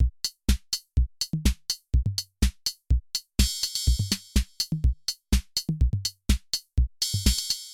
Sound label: nord drum 2